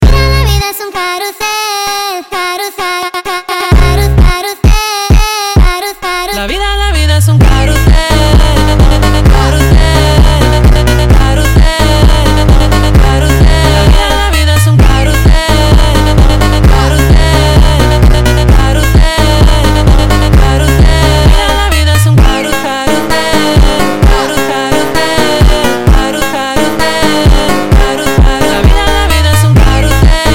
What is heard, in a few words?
Phonk Music; فانک phonk